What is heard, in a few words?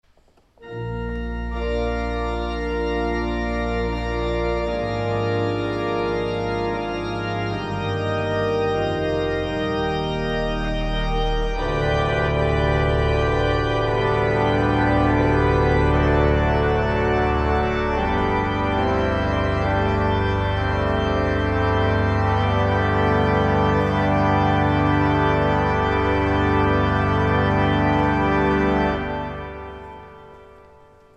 Organ; St. John's Cathedral, Jacksonville Florida